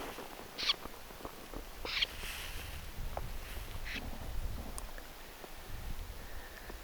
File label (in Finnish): ilm jonkinlainen suippopyrstokurppalaji